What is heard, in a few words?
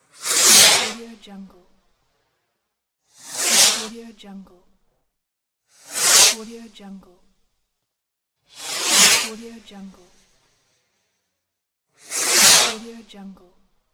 firework launch; urban sounds